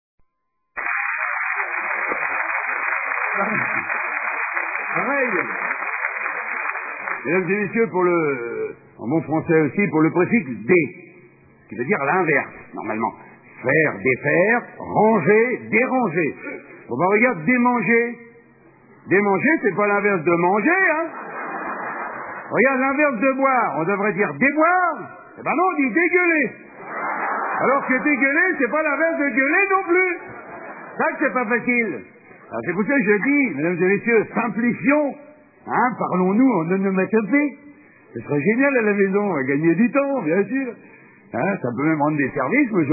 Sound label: 8bit